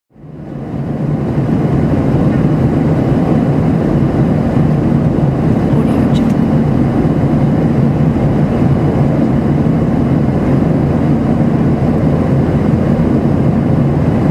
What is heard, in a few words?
in airplane